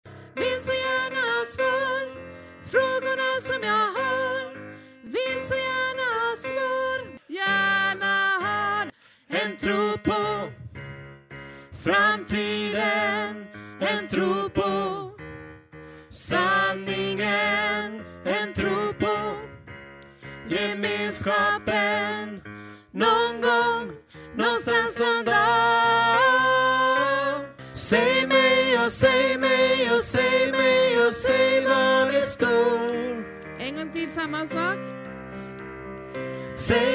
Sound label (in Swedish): bas, stammor